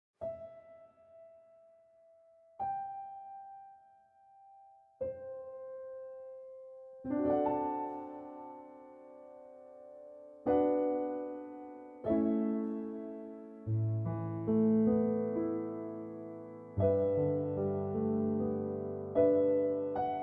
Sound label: World Music